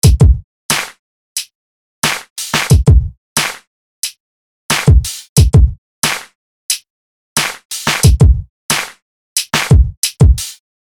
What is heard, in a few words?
drum pop kit